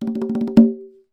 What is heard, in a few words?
Fill, Conga; musicradar